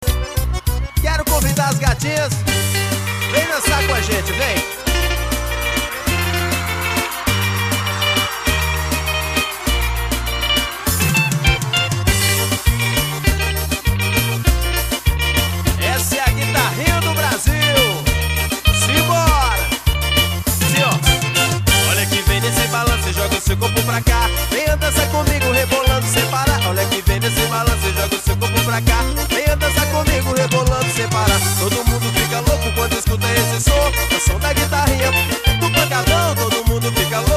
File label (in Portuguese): GUITARRINHA, forro